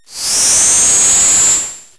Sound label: Fade; Treasure Galaxy (1994); Learning Company